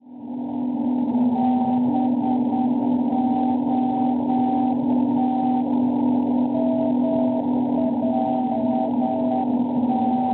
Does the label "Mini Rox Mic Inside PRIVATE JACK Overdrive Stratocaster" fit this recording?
No